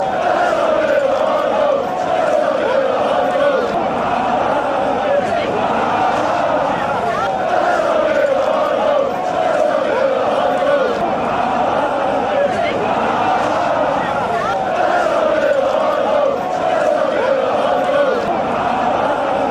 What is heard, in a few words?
huddle song, fanchants